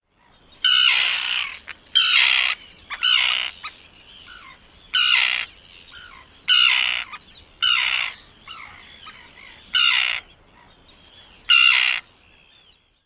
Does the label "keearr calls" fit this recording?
Yes